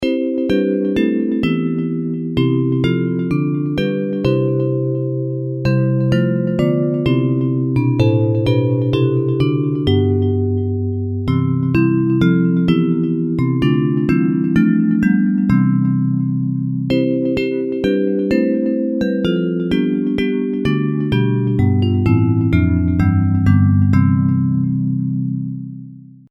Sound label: Bells, hymn